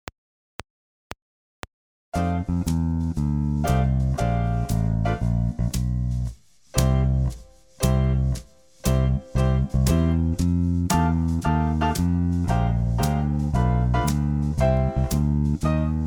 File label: Some Jazz; baixo; dan dean